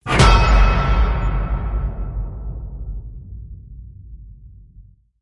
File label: Hit Metal; Ninja Tracks